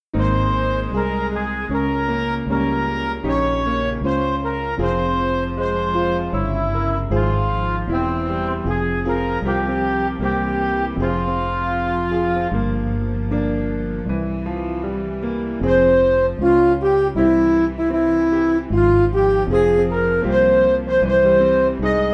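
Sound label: Music Backing Files for Churches; 2/Fm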